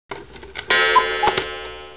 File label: CUCKOO